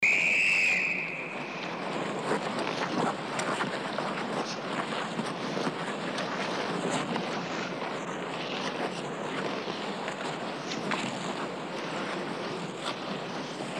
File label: skating hockey drill